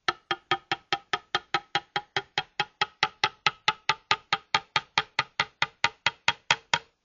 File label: Normal ceramic mug with fresh cocoa powder